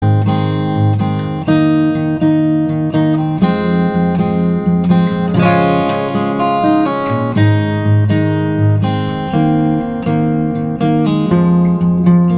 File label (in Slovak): gitara, HUDOBNÉ NÁSTROJE; hudobný nástroj